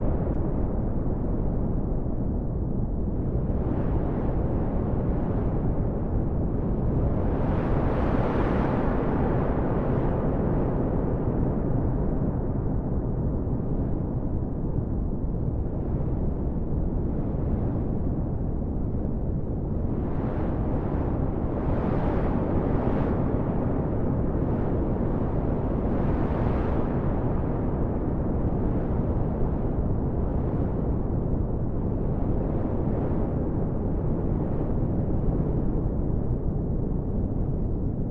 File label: huge planet rumble empty large space sci fi planet ambience exterior; sonniss gdc